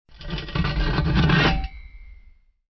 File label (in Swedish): metal, gnissel; METALL